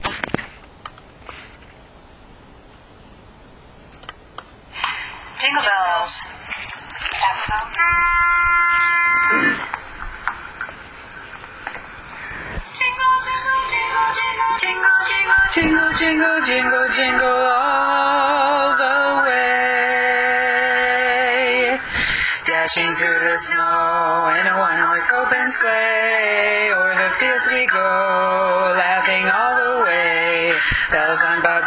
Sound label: Jingle Bells bass